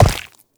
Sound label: Step Flesh; Warframe; Uriel